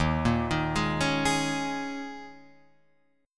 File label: D sharp 7th Suspended 4th, Guitar, Open F; D#7sus4 arpeggiated; JGuitar